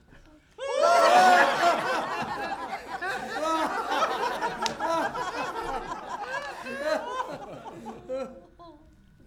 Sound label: Laughing Audience Laughtrack